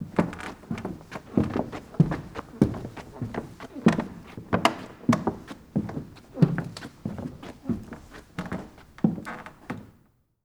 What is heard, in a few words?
Footsteps Wood; HauntedBloodlines